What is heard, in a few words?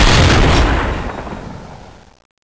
hammer